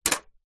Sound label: CD Player Close